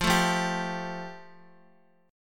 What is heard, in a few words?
F Major Add 9th down, Fadd9 chord, Guitar; strummed; JGuitar